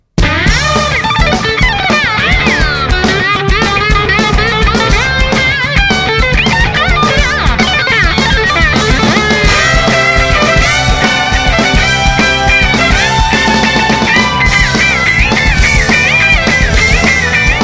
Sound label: fretless guitar solos